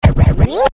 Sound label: Slide; SonyEricsson; W595 (Linda)